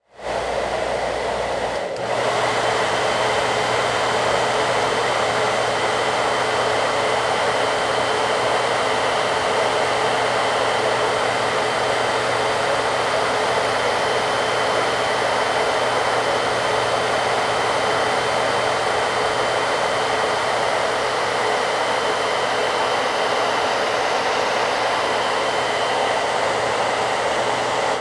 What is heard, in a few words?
Household Hair Dryer Low To High